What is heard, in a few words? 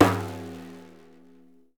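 bendir slap; Deff Bendir &Req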